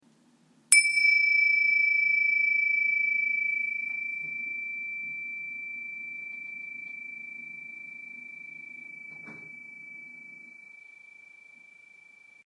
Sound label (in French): Cymbales 7,5cm dragons graves